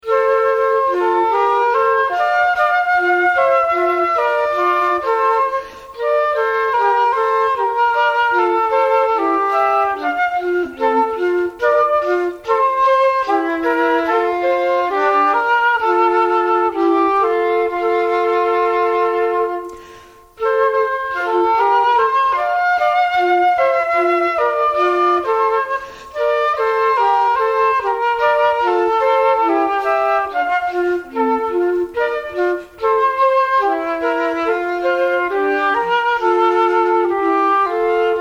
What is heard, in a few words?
Menuet; Gluck